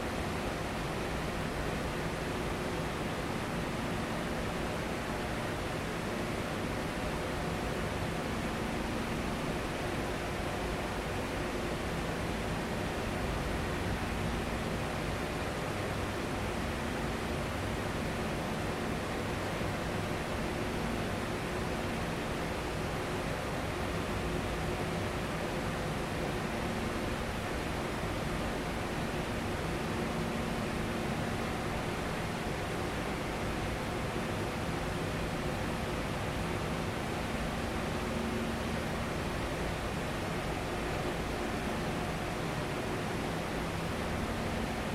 vnezapnij bolshoj shumnij zvuk raboti proizvodstva

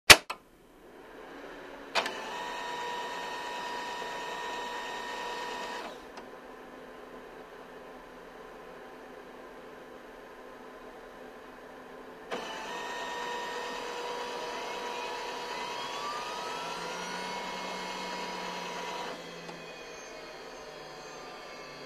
lazernij printer

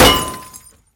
zvonkij grohot metallicheskoj posudi s razletayushimisya steklyannimi oskolkami

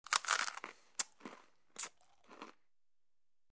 kusanie chavkane hrust edi

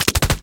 pulemetnaya ochered iz zasadi

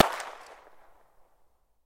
gluhoj korotkij odinochnij vistrel

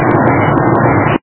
vodopad shumnii korotkii dalekii

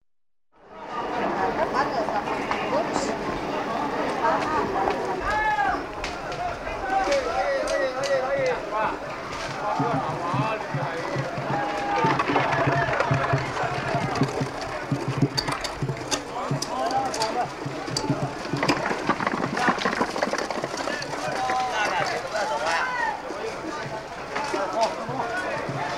vostochnij rinok na otkritom vozduhe

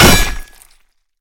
trenirovka s popadaniem po zheleznoj celi mechom

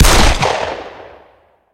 ispitanie novogo strelkovogo oruzhiya

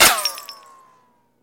metkoe svistyashee popadanie puli v stuklyannuyu vazu

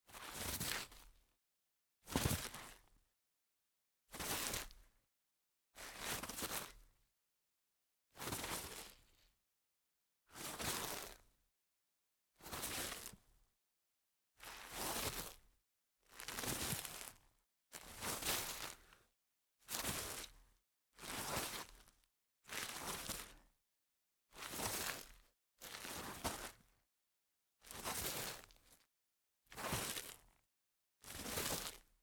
tyanut chto-to po trave

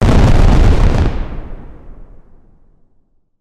vesennij festival fejerverk dlinnie zvukovie impulsi

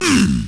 vorchanie rezkij ston

